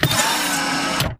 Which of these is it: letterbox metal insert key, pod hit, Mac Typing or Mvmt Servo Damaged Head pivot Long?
Mvmt Servo Damaged Head pivot Long